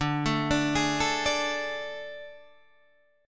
A Major 7th Suspended 4th D

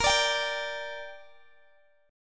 A sharp 6th, Guitar Open G

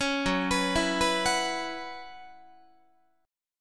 Gb 7th Suspended 4th Gb down Guitar, chord

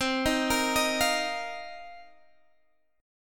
A sharp Suspended 2nd Suspended 4th C

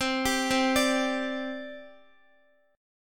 C Suspended 2nd, C, down, Guitar; Csus2; arpeggiated

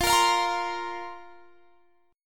F Suspended 4th F; Fsus4; strummed; x,20,20,22,23,20

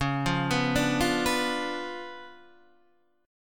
Db 7th Flat 9th, Guitar, Open D

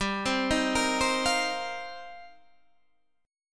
D sharp 6th Add 9th, G

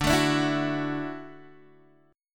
D-Major Add 9th D down Guitar DADGAD

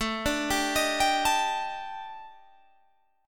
Eb Major 7th Flat 5th A, guitar

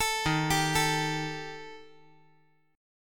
Eb Major Flat 5th Eb

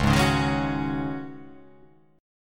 C sharp Suspended 2nd Suspended 4th, C sharp

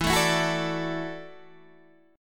E 9th Suspended 4th E down Guitar Open D

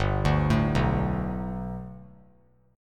G Major Add 9th chord; Gadd9 arpeggiated; JGuitar

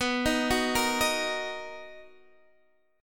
B Major 7th B down Guitar Drop D

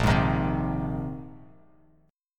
Bb 9th Suspended 4th Bb down Bass Standard; strummed; JGuitar

